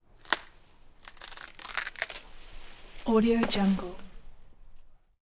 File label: tree branch split